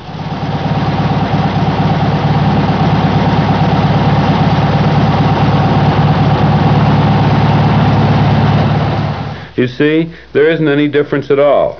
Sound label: norm engine; Normal engine as clutch is pushed down and let back up; Muir